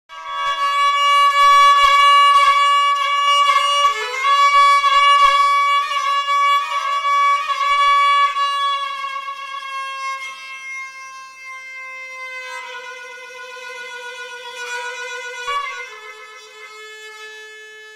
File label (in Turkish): kabak kemane